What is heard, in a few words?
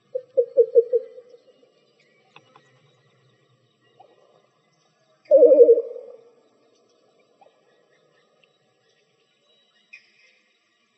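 Least Bittern, Large Wading Birds; LEBI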